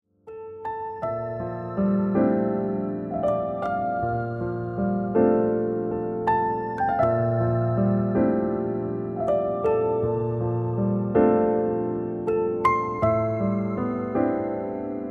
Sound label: forest piano